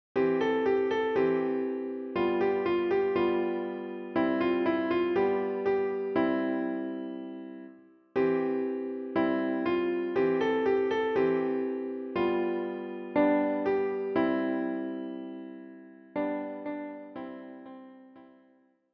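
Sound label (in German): Klavier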